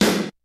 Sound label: KICK SNARE; Kid Nepro; Elite; KICKS SNARES